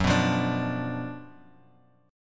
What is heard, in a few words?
Gb Major 7th Suspended 4th Sharp 5th, Bass Standard; GbM7sus4#5 strummed; JGuitar